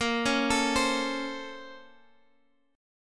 A sharp Minor Major 9th, down, Guitar, Half Step Down